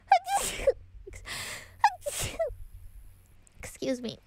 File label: Sneeze